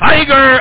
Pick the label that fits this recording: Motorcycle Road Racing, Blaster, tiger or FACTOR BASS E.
tiger